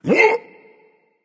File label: surprised, voice; Smite; ymir skin14